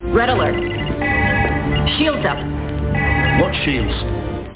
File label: SHIELD UP